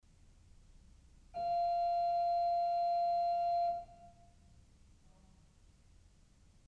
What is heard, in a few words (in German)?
Principal 4' f1, ORGEL pipes